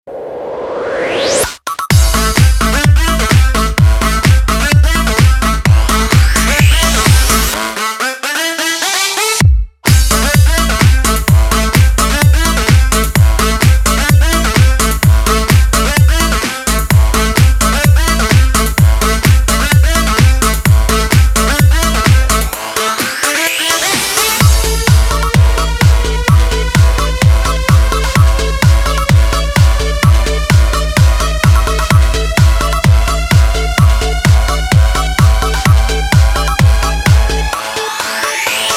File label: Disco Dance